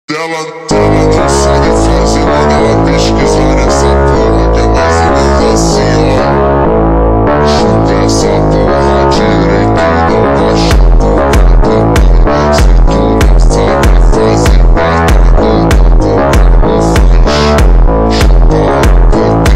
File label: phonk slowed reverb